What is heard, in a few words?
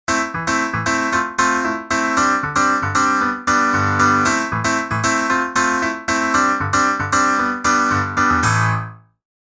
Dance